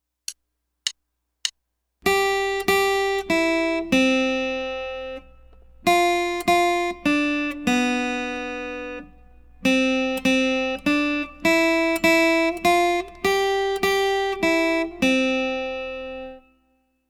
Waltz

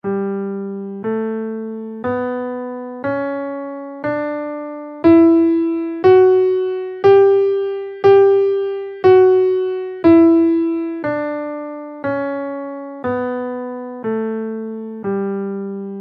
lydian scale in G, guitar

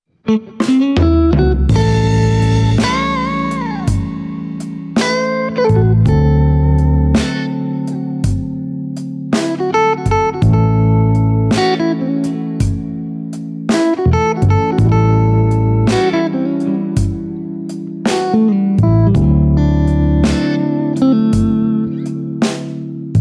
blues licks

